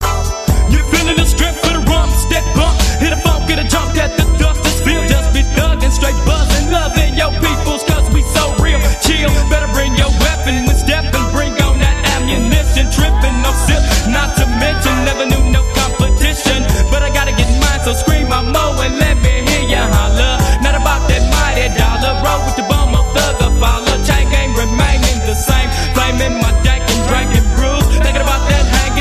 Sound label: hip hop call tone